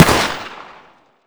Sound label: mp5 fire; weapons